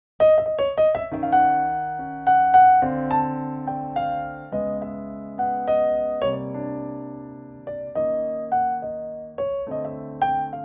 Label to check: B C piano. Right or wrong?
Right